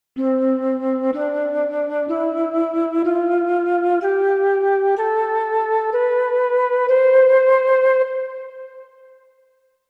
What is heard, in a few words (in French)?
gamme